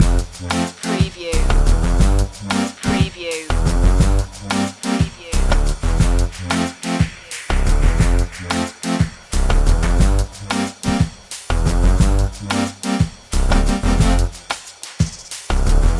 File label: retro video game ferris wheel loop